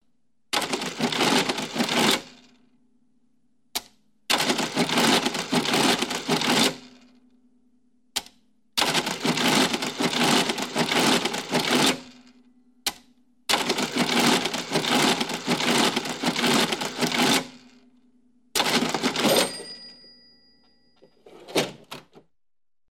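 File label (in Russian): старый кассовый аппарат отбивание чека звонок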